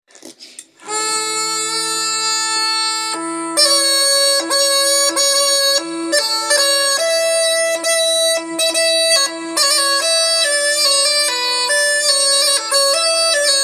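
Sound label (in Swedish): Marsch, svensk säckpipa; efter Per Löf Ekshärad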